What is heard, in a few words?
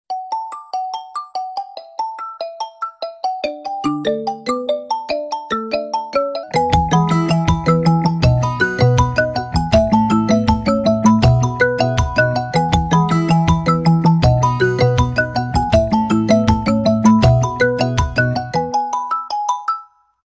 Xiaomi Poco C51 Ringtone